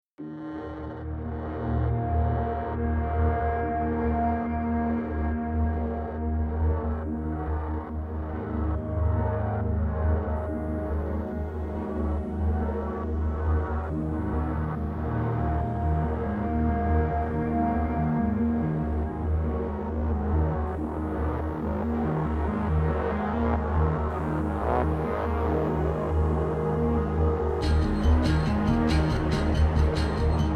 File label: no choir